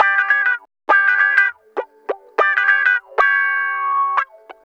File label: Guitar Loop; Guitars; Ambient Grooves